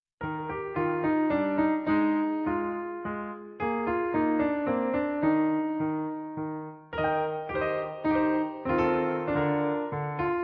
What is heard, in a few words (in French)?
menuet; mozart wolfgang amadeus